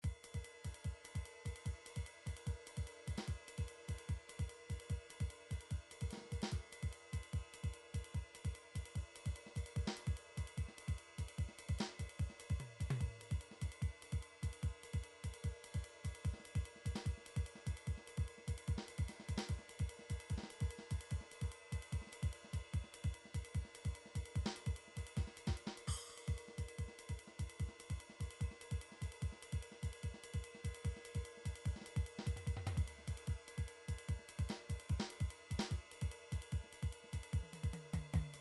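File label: drums fast salsa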